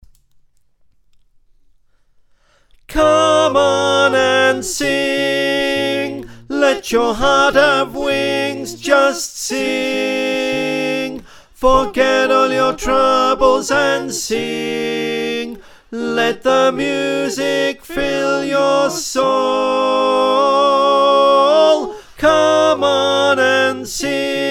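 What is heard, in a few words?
tenor, choir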